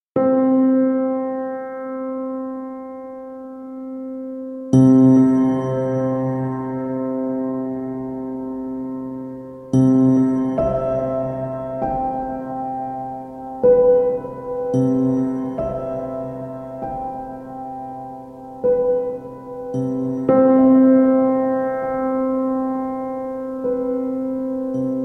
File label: Piano Sounds